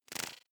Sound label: sci-fi expand; sweeps-swells